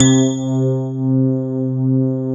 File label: PF C 5 -L, GRAND PF; AKAI S-Series CD-ROM Sound Library VOL-7; D.GRAND PF